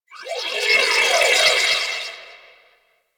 Ship Market Data Blips; Warframe; Corpus; Ship Remaster

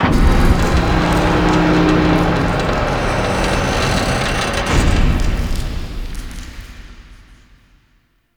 Capture Obj Landing Pad Raise; Warframe; Grineer Forest

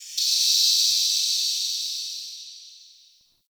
Relay Steam Blast; Warframe; Ambience; Hub; Tenno Junction Relay

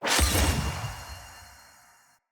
Shield Power Cast

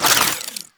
Idle Spin; Parazon

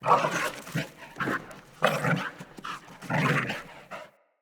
Pack Wolf Run Grunt; Animals

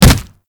Generic Hit; Warframe; Characters; Tenno

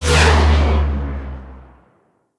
Dodge Front, ship; Vehicles; RailJack

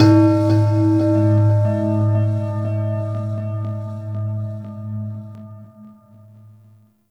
Temple Low Bells; Ambience; Corpus Ship; Temple One

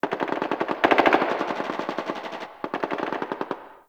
Distant Gunfire

